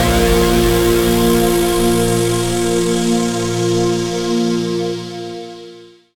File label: SYN DANCE; Sound & Vision - Gigapack II